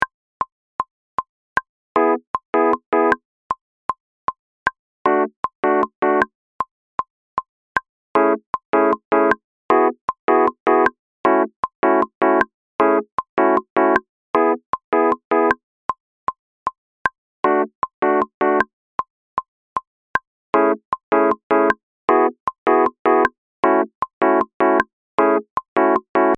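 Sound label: Electric Piano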